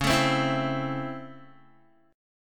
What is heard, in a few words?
C sharp Minor Major 7th Sharp 5th C sharp Guitar; C#mM7#5; strummed; 9,12,10,9,12,x